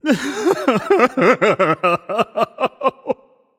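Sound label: nonverbal boohoo; voicepack; smite; merlin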